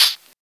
Original Hihat A Key, hi-hat tuned to the A note, Loudest frequency: 4747Hz